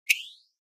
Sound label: Button HF Elect Whist